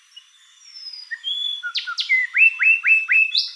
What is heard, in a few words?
wren, thryothorus pleurostictus; bosque seco